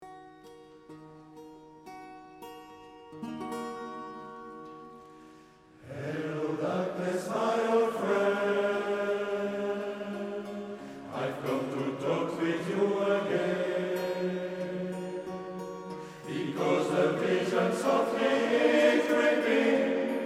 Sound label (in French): musique